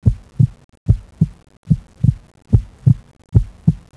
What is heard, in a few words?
heart beat